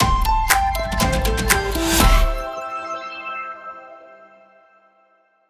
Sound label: Stem LOGO